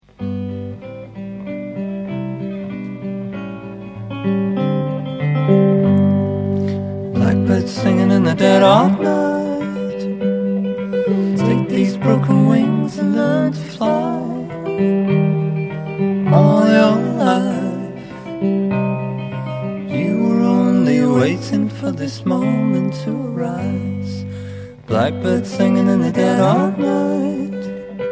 acoustic duo

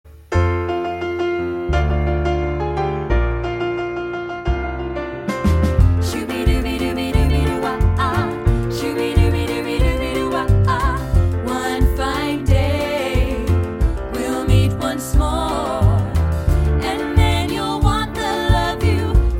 vocal trio

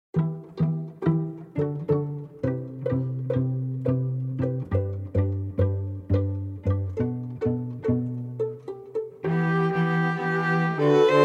quartet; classical musicians

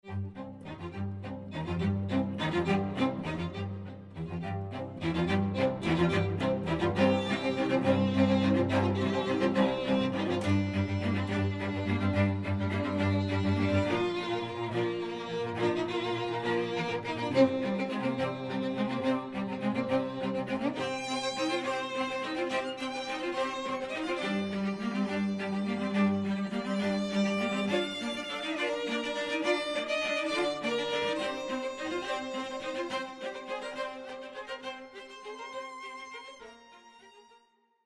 strings

